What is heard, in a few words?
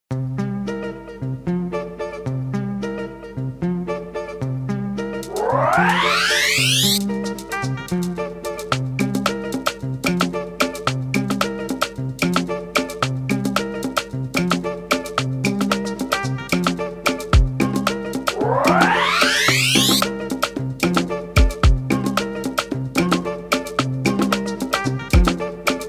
123bpm